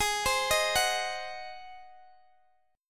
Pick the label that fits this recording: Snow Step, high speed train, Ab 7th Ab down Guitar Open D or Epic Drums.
Ab 7th Ab down Guitar Open D